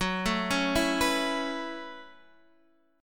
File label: F sharp Minor 11th, Guitar, Open D